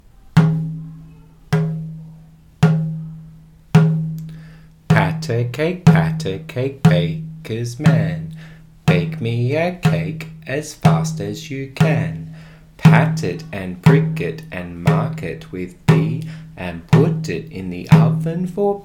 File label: Pat a cake with drum; Moving creatively to music and doing actions to rhymes with Socky (sock puppet).